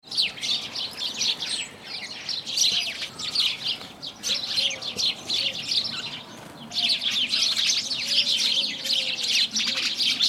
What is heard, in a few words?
House sparrow sounds